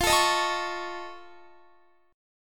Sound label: F Augmented 7th F Guitar, chord; F+7 strummed; x,20,21,20,21,21; JGuitar